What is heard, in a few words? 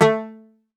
Greek Bazouki, Plucked Strings